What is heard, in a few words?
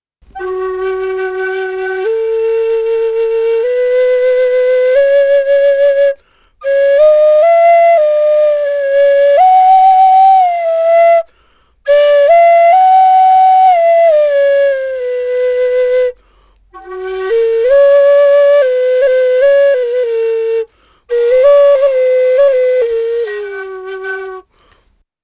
Flute Single